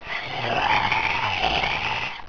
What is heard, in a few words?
gargle, pain, rumbly